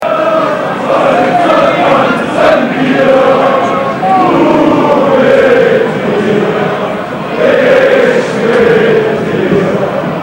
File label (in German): Walzer